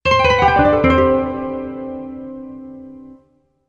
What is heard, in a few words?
Music Logo Guitar